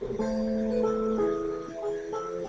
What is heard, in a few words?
string